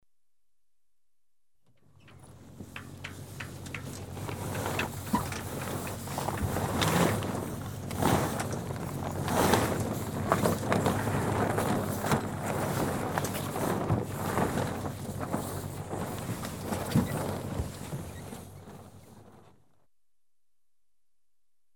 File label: Sailing Dinghy hauling up sail fast; The BBC Sound Effects Library